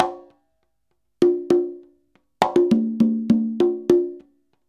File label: CONGA, Modern Percussion Loops